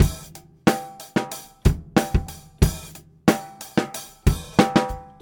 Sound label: Hip Hop Loops